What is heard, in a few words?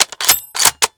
bolting, Guns; civ13; civ14; Weapons